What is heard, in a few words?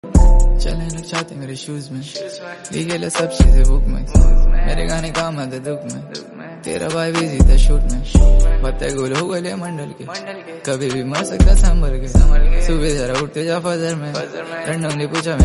song hindi